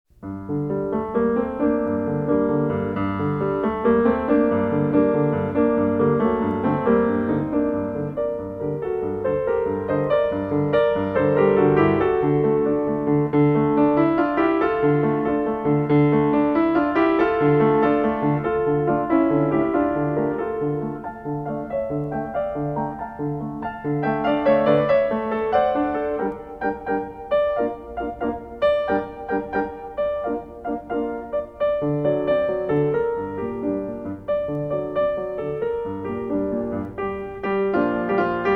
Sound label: Etude In G Minor; Magrath Book 06